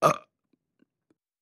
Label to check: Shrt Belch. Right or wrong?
Right